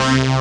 Stab

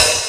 Boss DR-202 hat

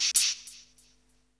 SHA FX, Hip Hop R n B; USB Soundscan vol.19; AKAI

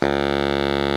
baritone FF C#1, Sax; Giga Samples Collection

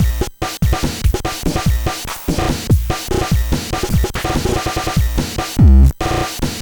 NES Drum IDM Loop, 8-Bit; Nintendo Entertainment System; Drums; Chiptune; Sonic Foundry (Sony Creative Software)

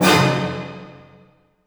C DIM, ORCHHITS; Sound On Sound - Peter Siedleczek's Orchestra

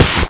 Beatbox Snare, Human Beatbox

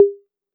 808 CH, Roland MV-8008; Roland MV8800

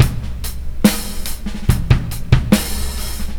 Bongo Butt 71 bpm, breaks; Zero-G Beneath the Planet of the Breaks

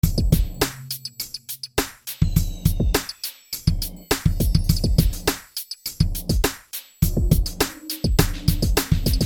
Beat; Sonic Foundry (Sony Creative Software); Infinite Soul VOL-1; Beats

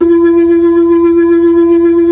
B-3 C3, Keys of the 60s and 70s; Roland LCDP10 Keys of the 60s and 70s 2; Blues

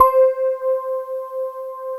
CRYSTALS

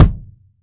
RBD FF, ROCKKIT

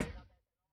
IND hihat; Yamaha DTXpress-II

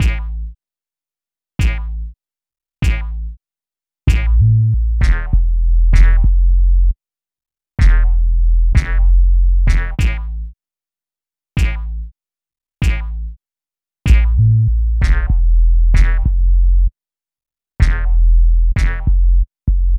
Bass, Hip-Hop

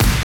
kicks

